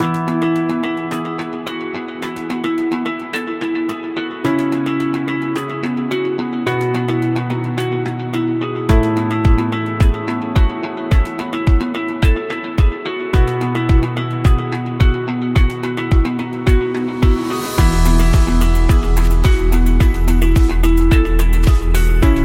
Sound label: Positive Soft, corporate music; corporate music vol 18